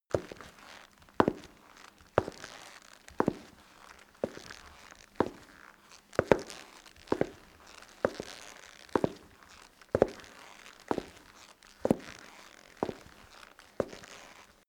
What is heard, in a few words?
step stone walk